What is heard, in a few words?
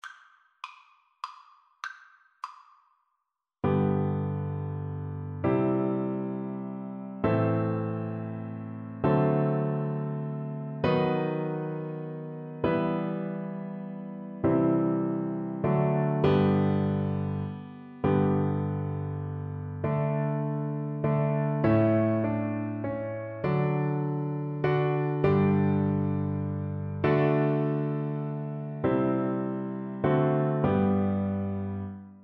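recorder karaoke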